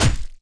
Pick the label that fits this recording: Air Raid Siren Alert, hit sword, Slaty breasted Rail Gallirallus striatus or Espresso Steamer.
hit sword